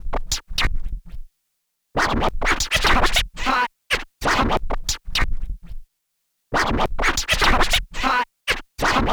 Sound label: Scratch; Sound FX